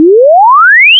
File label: sweep 300 330; mozilla-central; webaudio